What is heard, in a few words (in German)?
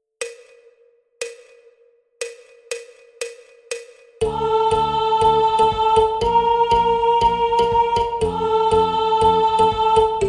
Gesang Interlude 1. Stimme